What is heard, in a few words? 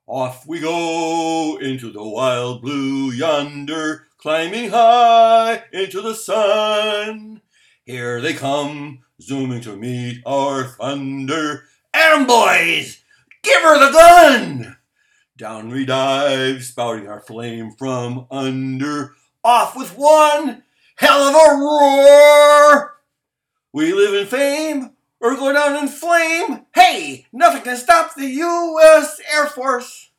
air force anthem